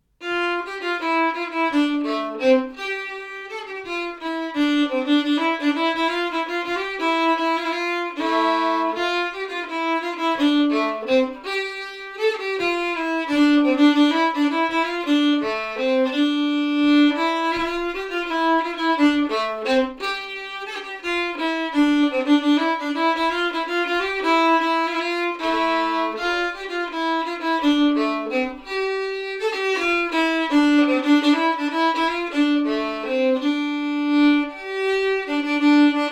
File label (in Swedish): fetpolska stämma